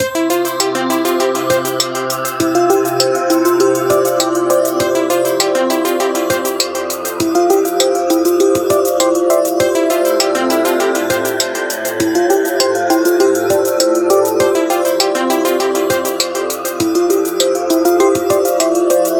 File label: Church Spirit, ringtones; Lenovo; A6000